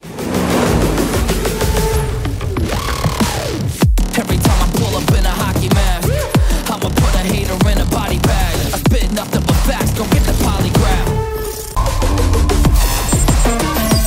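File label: background music ringtone; BAZOOKA TEASER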